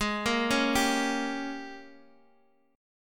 Ab Major 7th Suspended 2nd Suspended 4th, Guitar, DADGAD; x,x,6,3,4,5